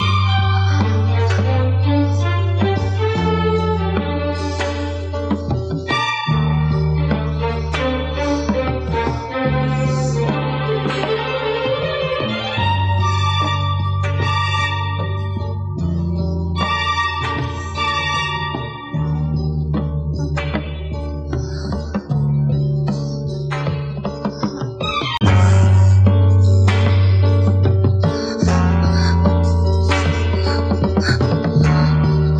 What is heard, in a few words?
strings, Loops; Phonky